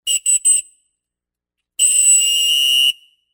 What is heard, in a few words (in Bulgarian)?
fox 40 pearl съдийска свирка